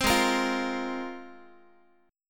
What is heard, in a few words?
Ab 6th Add 9th Ab, Guitar; 15,16,13,15,x,x; Ab6add9 strummed